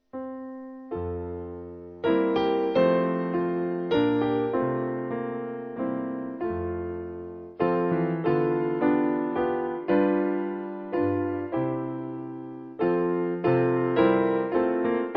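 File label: Piano, Music Backing Files for Churches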